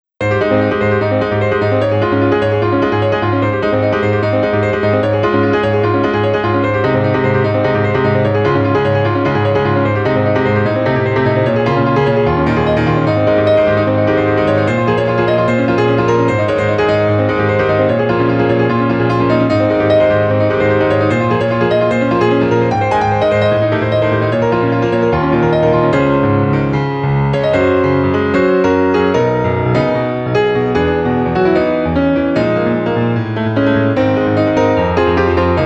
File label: piano; Kirbys Block Ball